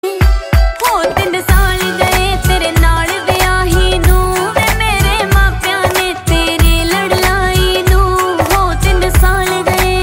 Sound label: Hindi Ringtone